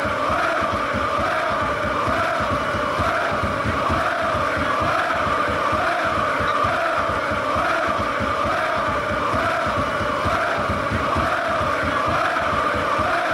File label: discoland fanchants